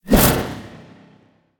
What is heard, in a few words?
fire; Smite; Vamana